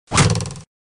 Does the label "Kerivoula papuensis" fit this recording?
No